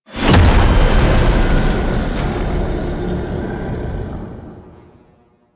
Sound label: Alchemy Fully Filled Stinger; Warframe; Entrati